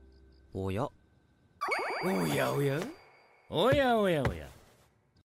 OHOHO

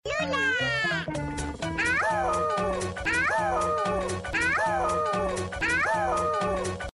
Howl; Pocoyo And Lula

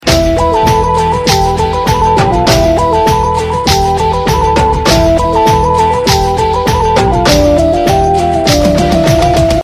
Message Dhooooom

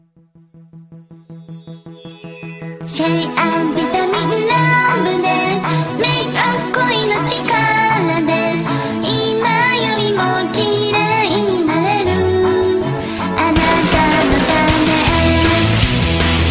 Cute Japan Song

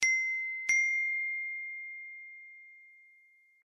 iOS 2 Tone Note

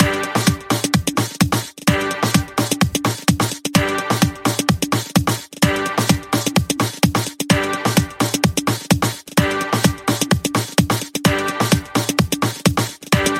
Glorious Beat

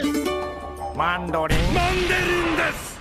Mandolin

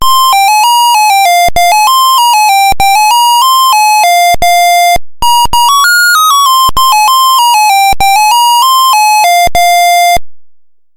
Brick Game Startup

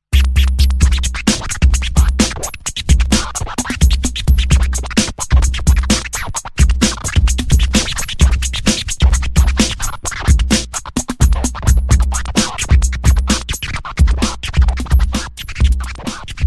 Powerful Scraching

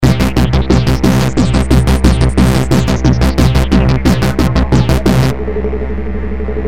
Cool Techno

